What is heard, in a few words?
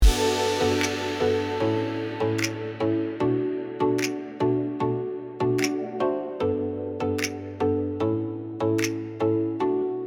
Future bass melodic electric rise